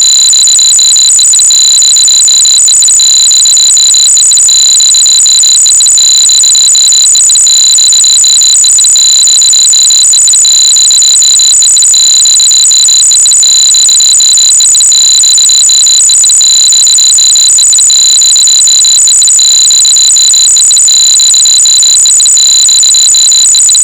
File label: QUIET ALARMS DORGARD PRO AUDIO COMMAND